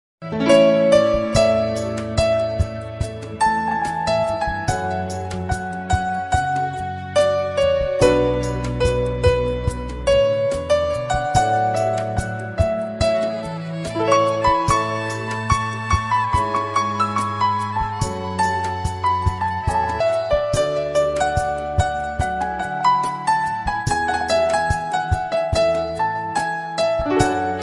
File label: Mobile Ringtone Instrumental Ringtone Popular Romantic Music Tone